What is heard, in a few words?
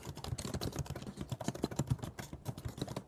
typing loop